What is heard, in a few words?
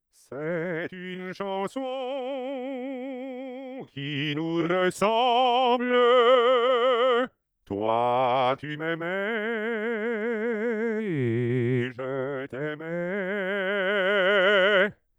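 morphing Intensity; morping Intensity SVP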